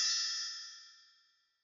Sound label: Buy Upgrade; GUI